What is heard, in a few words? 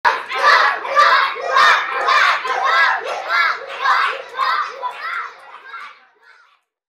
Barking